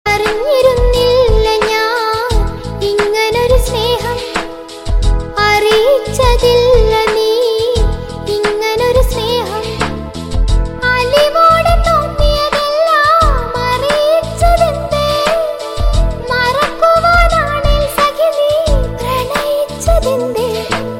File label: malayalam tone